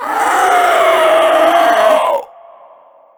leaper dead; trespasser

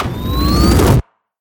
torcher charge

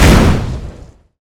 explode; bigtrapper

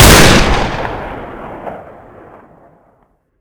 rapidfire shoot

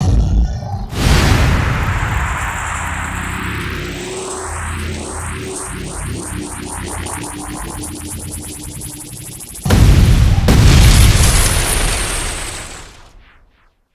charge up portal, cinematic

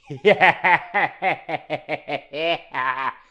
mechanic laugh

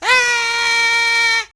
zombie special screamer

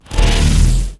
axe fire; argent

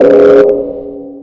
alarm; mannhattan

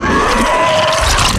boss dead; pyrohunter

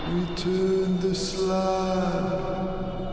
spooky ambient sound

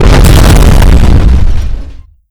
giant explode

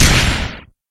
chain arm fire; phantasm

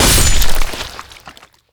scythe hit flesh

